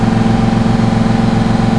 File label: MOTOR, Slot Car